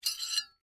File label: Sai Pull Apart, Warfare, Sai, Pull, Apart, Handle, Metal; Sword; Combat; BlastWave